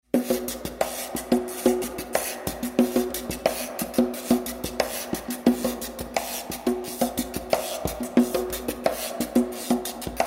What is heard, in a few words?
Percussion Hall